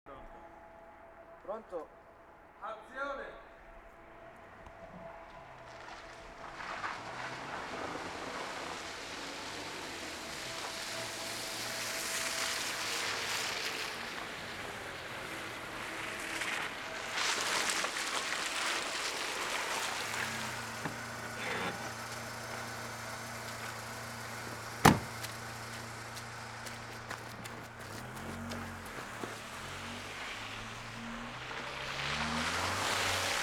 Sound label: Car arrive leave go